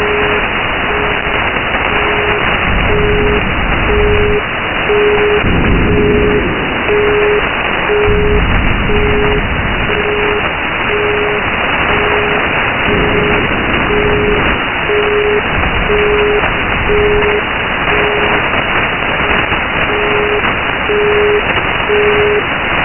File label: 420 Hz